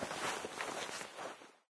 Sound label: taunt clothes rustle; video games; source engine; tf